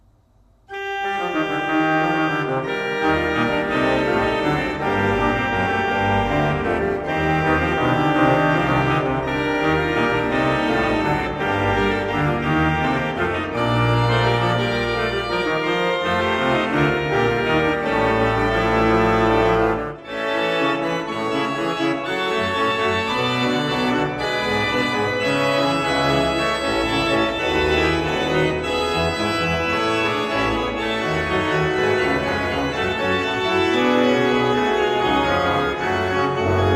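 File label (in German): Verschueren 20 II P, pfeifenorgeln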